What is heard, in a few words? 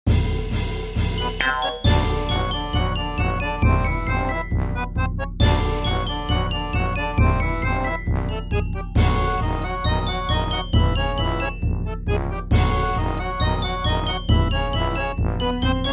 slippery slide